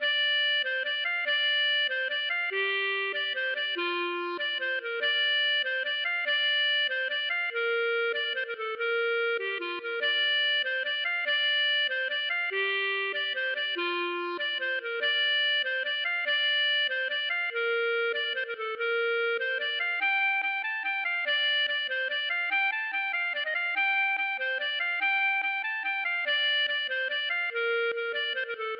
mazurka

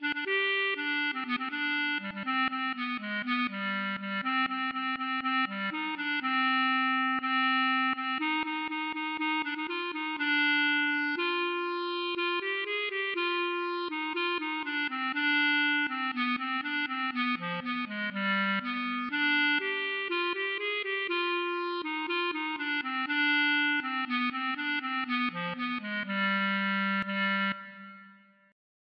klezmer

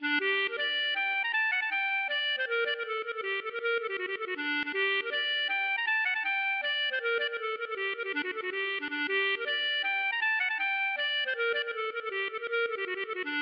melodie 2 fiddles

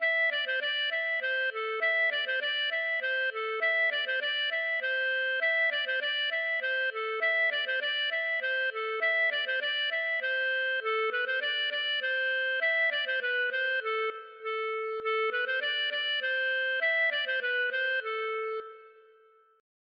hanterdro; Choupette

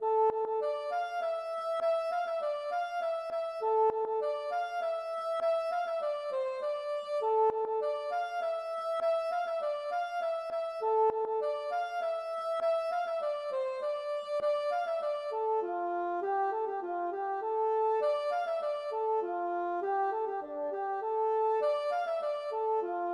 hanterdro; Dubonnet

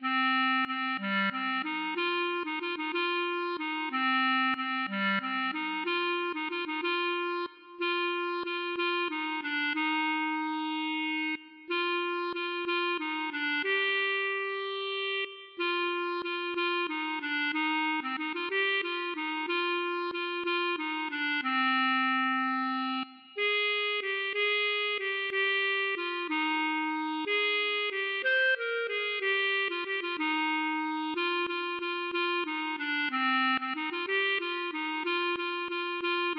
klezmer

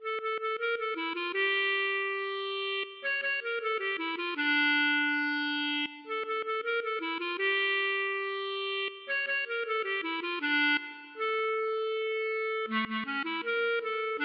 klezmer

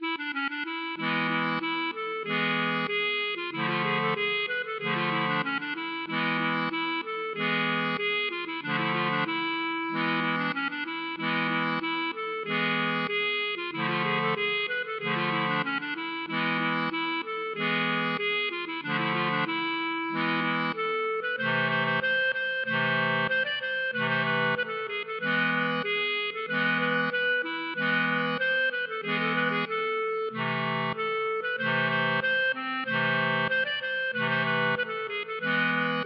klezmer

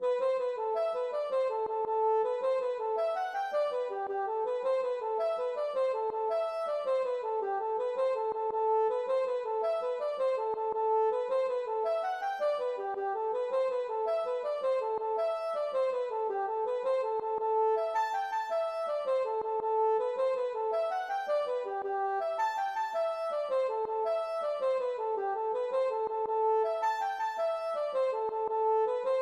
jig

